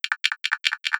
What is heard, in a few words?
reload